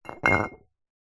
glass bottle 1 ltr set down tiled floor

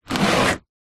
vehicles car battery slide on ground

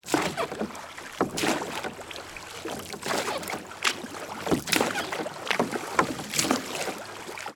transportation boat rowing boat row oars lake; sound effects one

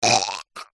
Gag Choke

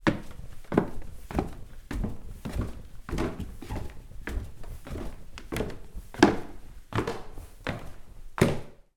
footsteps up wooden stairs old farm house

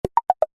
multimedia notification subtle ui clicks pattern

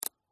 camera exposure dial rotary movement click; fuji; mkh8040; PMSFX

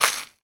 human eat crunch toast; sound effects one

